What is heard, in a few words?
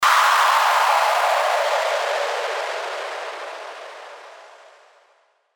WHOOSH